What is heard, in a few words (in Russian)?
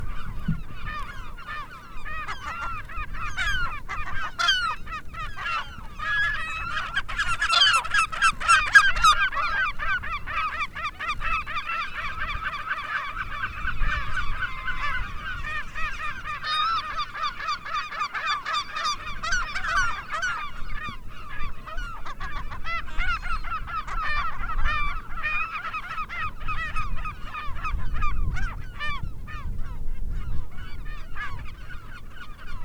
dominikanskaya chayka larus dominicanus